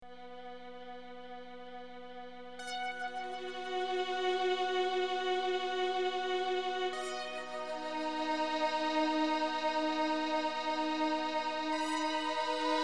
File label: drone